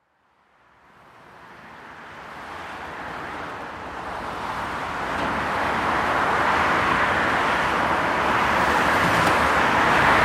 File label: pedestrian bridge over aleja armii ludowej